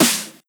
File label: Snare Trap; pitchglitch